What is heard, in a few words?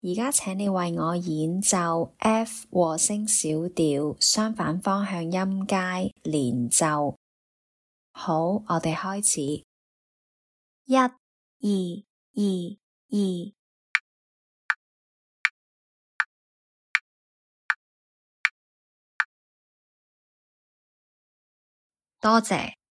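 F minor harmonic contrary motion scale legato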